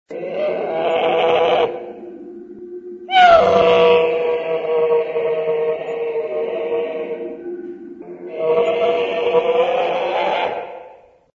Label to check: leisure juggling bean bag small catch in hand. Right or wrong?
Wrong